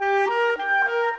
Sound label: Clarinet Flute; Sonic Foundry (Sony Creative Software); Orchestral VOL-2; Modern